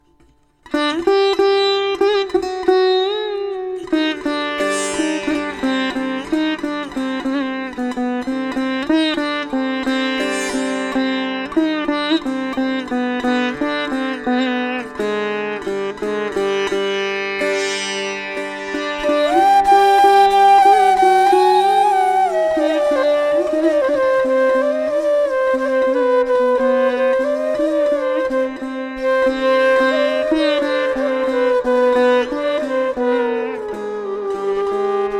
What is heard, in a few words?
Ringtone; Iphone